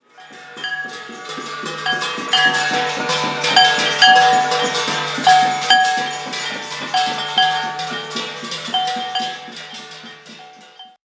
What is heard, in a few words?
Loud Bells on Ganga Haridwar, Religious Music; Samuel Corwin; Selected Field Recordings from India and Nepal